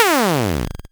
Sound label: lose; kenney desert-shooter-pack 1.0